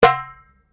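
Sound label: Drum Darabuka Slap Mute forte, Egyptian Darabuka Drum; Drums; Musical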